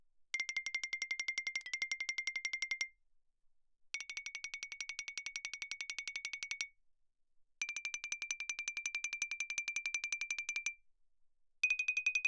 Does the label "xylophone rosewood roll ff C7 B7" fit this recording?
Yes